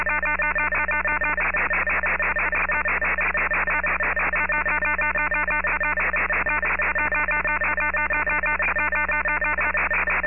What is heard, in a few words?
signal